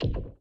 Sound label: Moving Obstacle Stop Impact; boomerang fu